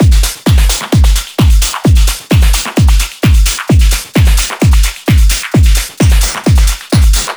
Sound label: Drum Loop 130, Techno